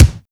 original bass drum a sharp key

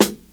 sizzly snare drum sound G sharp key; snare drum samples

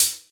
low treble closed hi hat sound b key

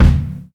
warm sounding steel kick drum sound D# key

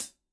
airy sizzle foot cymbal e key

